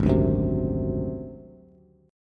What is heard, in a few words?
G sharp 7th Flat 5th, Bass; G#7b5; strummed; 4,5,4,5; JGuitar